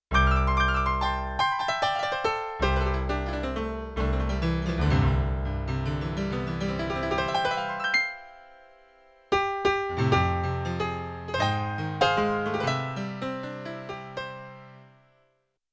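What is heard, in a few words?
Yamaha Acoustic Piano; Yamaha TX16W; Orchestral Performance 2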